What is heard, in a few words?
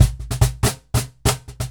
Cajon Fill; musicradar